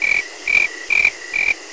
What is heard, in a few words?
cricket; quake2; railz; manke